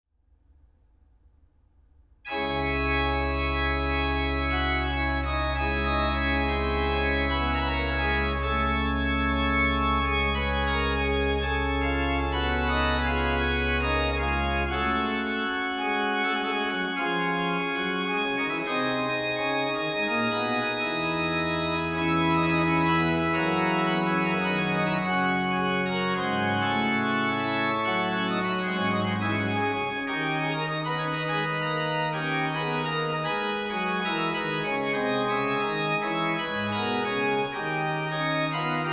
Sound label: Frescobaldi Toccata, organ; capillas